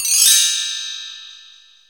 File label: DRM D#, YAMAHA R5+RX; Best Service ProSamples vol.55 - Retro Sampler; AKAI